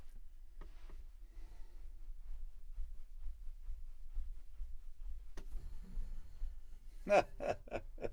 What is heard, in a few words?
Bass Bot